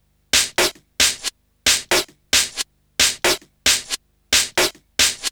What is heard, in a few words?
Snare Loop; Sonic Foundry (Sony Creative Software) - Fluid Dynamics Drum & Bass; Construction Kit